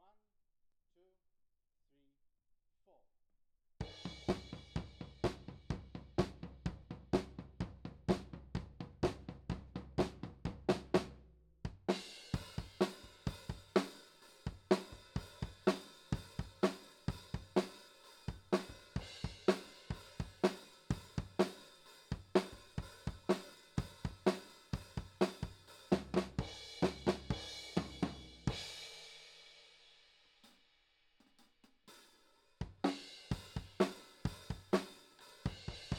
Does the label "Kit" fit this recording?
Yes